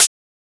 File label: Closed Hat; FLPRO